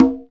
linn CONGA H; DRUM MACHINES

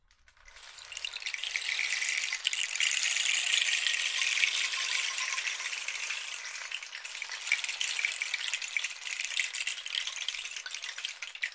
rain stick in and out; ETHNIC and WORLD PERCUSSION; Rainsticks

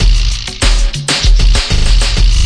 clutches candy, drum loops and breaks, 97 bpm; 091 to 100 bpm

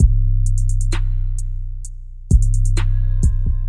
Kick Snare Hats 808; 121 to 130 bpm; Geeked Up

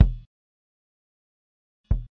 kick, Drums

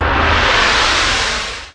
Electro FX; Ueb HE Electro FX

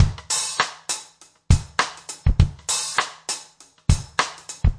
basic funk rims big oh, 100 bpm; 091 to 100 bpm

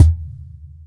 verby cajon bass

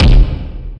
explosive verb kick; Kicks; single hits

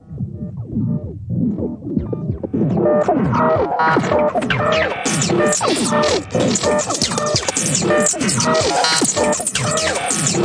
bug in the machine, synth and electronic

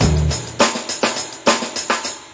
103 witch jam, drum loops and breaks

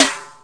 noisy bright snare; single hits

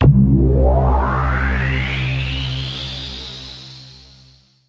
Sweep, Pads

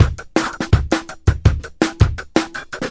live dropbeat, drum loops and breaks, 165 bpm

